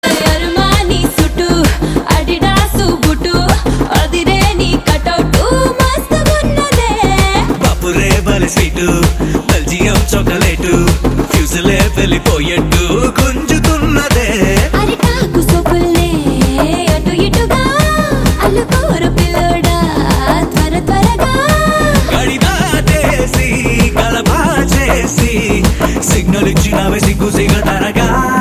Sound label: Ringtone